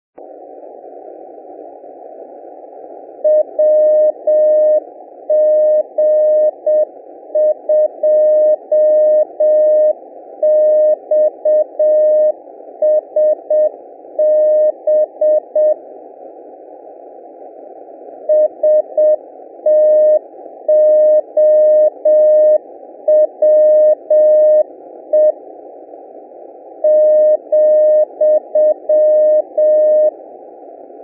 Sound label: 482kHz CW; Stow,MA; good copy in CT; WG2XSB